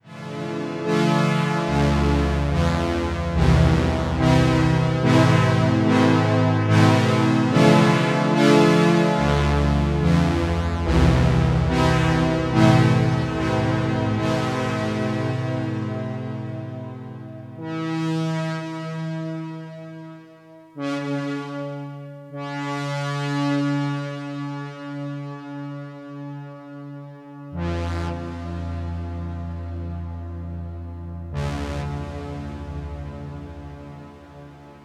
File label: Reverb DDRM Expander